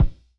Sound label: Thud Muted, Kicks; Airwindows